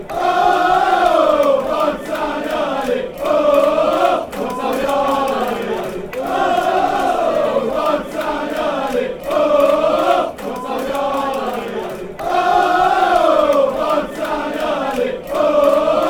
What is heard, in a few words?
oohh